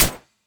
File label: miss, judge SFX; bansonic